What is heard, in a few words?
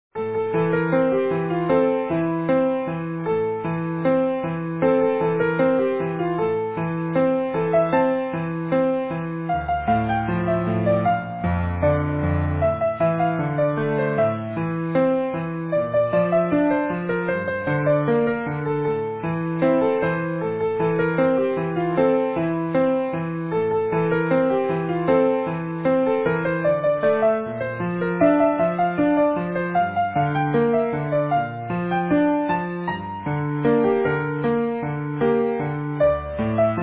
easy piano